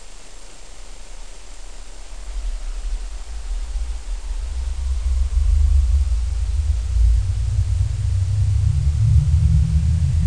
warp

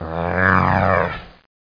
error

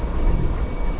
fan ambient; environment; starship trooper

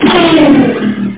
ion cannon blast; Quasar Phase II

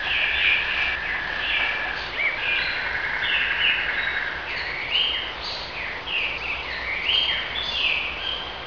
BIRDS; 10,000 Graphics Pack Volume 1; Media Graphics International

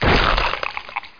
decap; Hexen 2

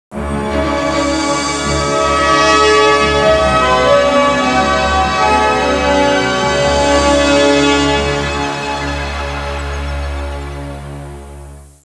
music; Babylon 5 - Entertainment Utility

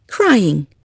CRYING

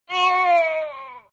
creature Death; fratboy

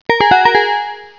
Banjo; MacAddict; iMOnTime 1.0.2

